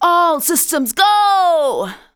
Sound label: vox, Techno Trance; Ueberschall